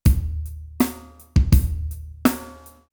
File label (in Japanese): ドラム